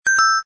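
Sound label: Camcorder Start, phonetones, LG A250 system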